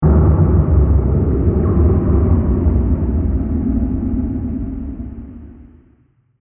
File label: Docking At Spaceport, spacecraft